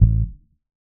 bass electric note A

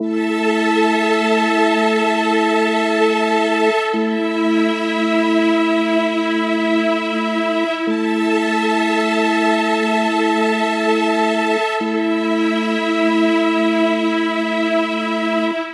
Bpm 122 A big and open pad

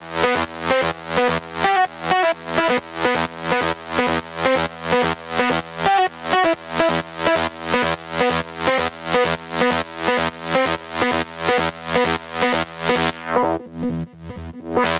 intro lead

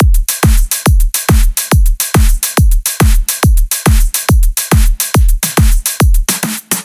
140 Full Drum Loop Triplet

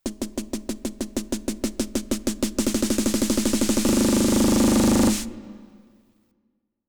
190 909 SNARE ROLL; MACKIE; COMPOUNDER

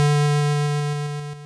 Synth Stab D2 Digital Chiptune 8 Bit

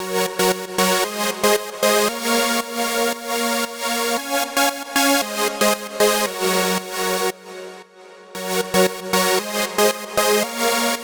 Lead Synth, F-, 115

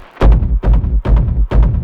DARK DRUM 130

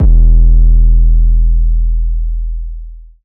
808 boomin E

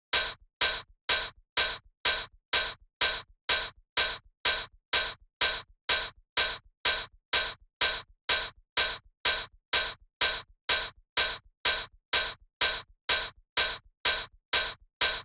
125 Tomtom Open Hat

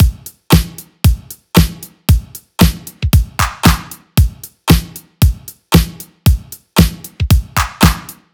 Drum Loop Full 115BPM

